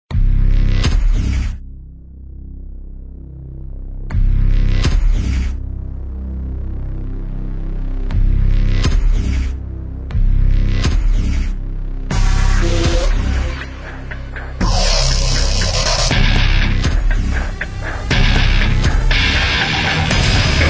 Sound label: Epic Trailer Intro Ident